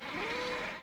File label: Intro Pod Panel Move Up